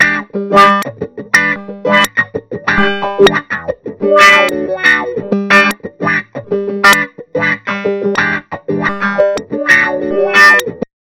Stereo Flanger, Psychedelic SynchroPulse, Guitar, Wet; Sonicircuit; Syntheway